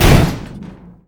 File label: Impact Medium; Totaled; XBOX